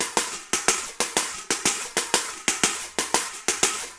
DRUMS SAMBA

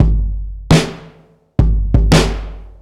Heavy Dub, Drum Loops

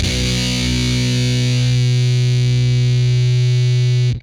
POWCDFUZZ, Guitar; Bass; Quantum Leap

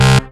Dance Mega Synth; Best Service; 90 s Sample CDs

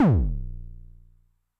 BD MOD [Pitch+Depth+Overdrive], 909mod, BASS DRUM

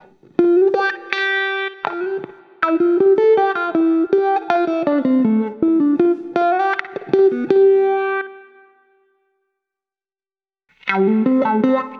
Wah Wah Gtr; Guitar

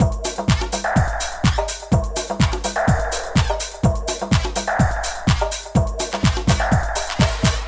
DRM, LOOPS 125, House; Best Service ProSamples vol.10 - House; AKAI

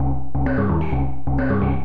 Cm 130 FX loop; Trance Explosion Vol1

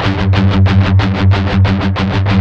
16TH, Guitars; Spectrasonics; Hans Zimmer Guitars VOL-1; MED 16TH

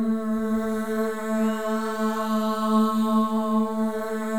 MOVING V3, Symphony of Voices; Spectrasonics

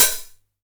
909 OHH Tape; Goldbaby Tape808 & Tape909; The Tape 909

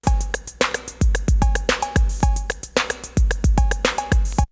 Drumloop; ZERO G - Datafile DISC 3

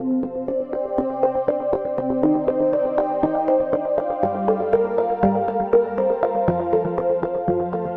NRG Pad

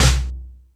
FX Sounds, Drum Machines E-MU Pro-Cussion

